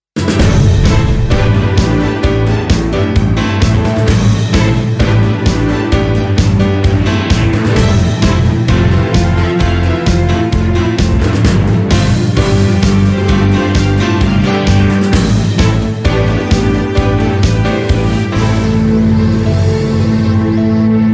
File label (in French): générique cent pour cent chasse